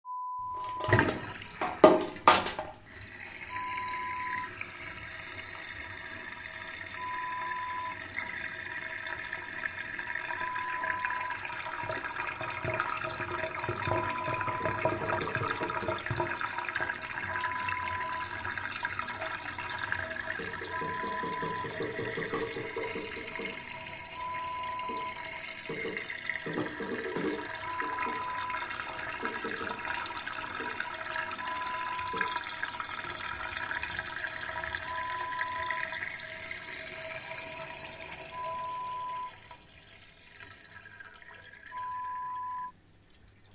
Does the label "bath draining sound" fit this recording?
Yes